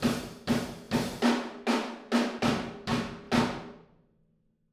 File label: Dancehall 100, Outro, Room, Drums; Sonic Foundry (Sony Creative Software); Drums from the Big Room The Mixes; Demo Projects